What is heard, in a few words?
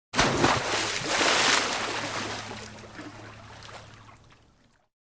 Large Splash Or Dive